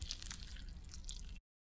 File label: stylized squish; soundscrate